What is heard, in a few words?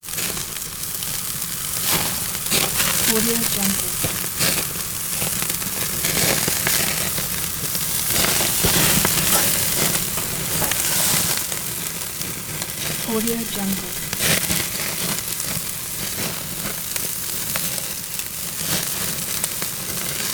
sizzle continuous; prosoundfx